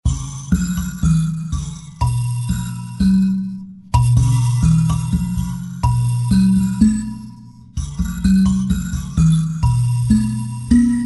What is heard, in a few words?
rumba box